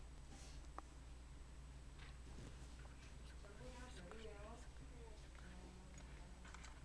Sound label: scream